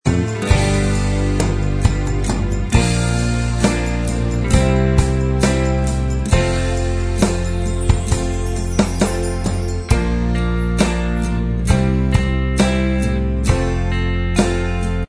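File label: instrumental, backing track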